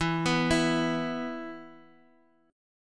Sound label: E 5th E, Guitar Half Step Down; chord